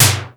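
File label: snare sfx, Yamaha Motif ES-Series; 300 Drum Machines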